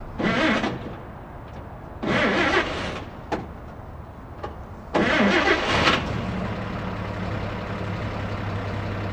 Chevy Trk 46 Strt X3 Di

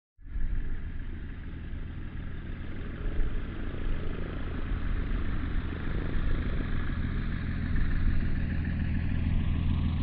Glider Tow Plane; heavy plane; sky dive

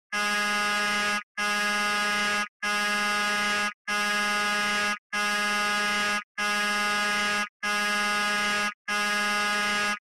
Alarm Collision

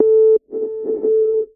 Button Press Confirm T

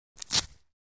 Biking Quick Velcro

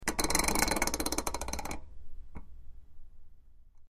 Metal Dial Large Meta, switch clicks

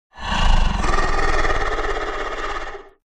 Monster Breath CU, creatures

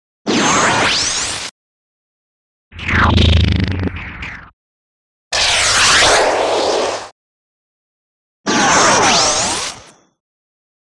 Gun Space Space Gun, lasers

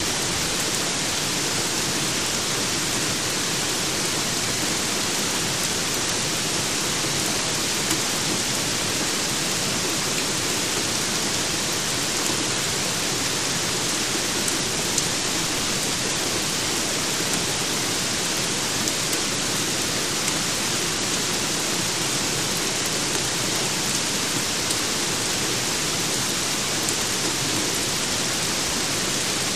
Rain Heavy On Porch

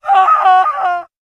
Death Screams Male

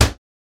Cabinet Door Slam, Distant, Ambient

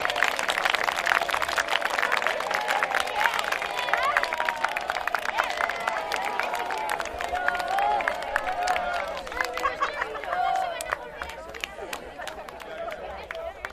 Spanish Crowd, spanish

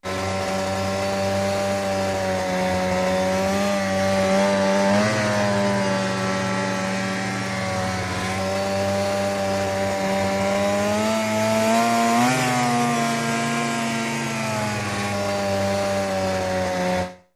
Snowmobile Steady

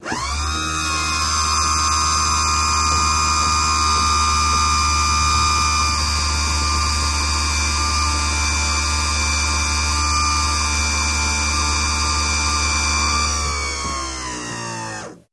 Boat Trim Unit Adjust

